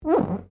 GLASS SQUEAK FINGER

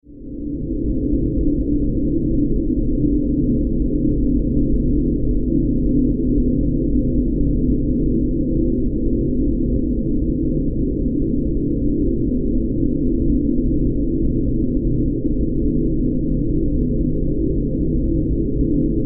Horror Drone Airy; Evil

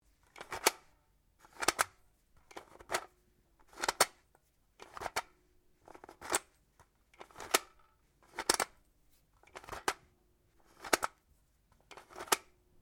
Rifle Styre AUG 5.56mm magazine unload 13 rounds; Guns; A selection of domesttic firearms

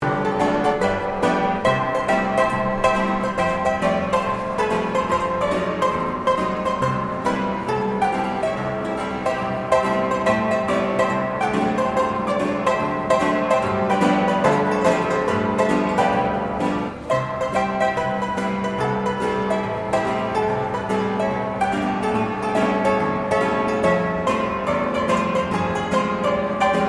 Ambience Melbourne Busker Piano; Melbourne Buskers; Concerts; SoundEffects-Foundation1

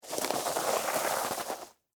Scrape Ramen Noodles; Texture; Scrapes; Sound Effects:Objects; BlastWave

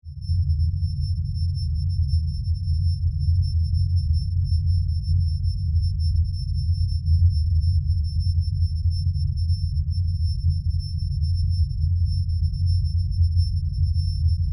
Drone High Pitch, Rumble, Scifi; Science, Fiction; Sci Fi Sound Effects; Blast Wave

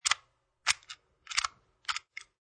Lock

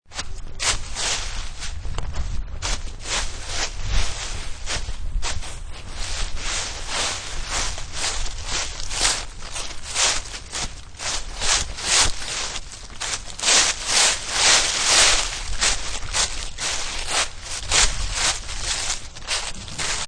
Footsteps Leaves, Walking through fallen leaves; Part of Footsteps (Sound Effects:Human)

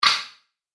Sharp Alternative Hit; Miscellaneous Impact Sound Effects; Andrew Fleming

